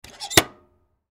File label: Video Game Close Game Compartment; Nintendo; Nes; Technology; Video Game Sound Effects